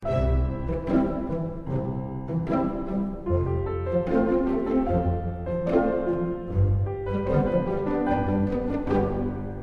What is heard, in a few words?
road music; Crowdy Farm Rush